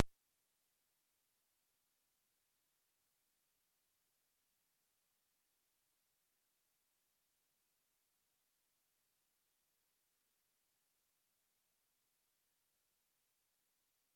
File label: singing practice